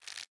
roman ranged bow charge; smite; npc; roman archer

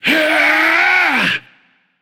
grunt lift; smite; olorun

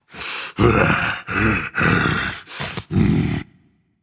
grumble, dragon; fafnir

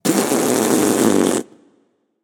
tongue, voicepack; smite; jorm

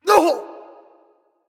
grunt hit

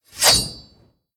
swing; smite; arthur skin9

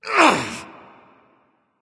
grunt jump; smite; voicepack; ullr

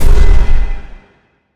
impact; Smite; Zhong Kui

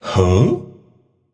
confused; smite; arthur

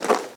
armor light; smite; chiron

